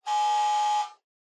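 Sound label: Doorbell Mechanical Buzzer Far 60Hz; ドアベル 機械式 ブザー01-14(遠い 60Hz)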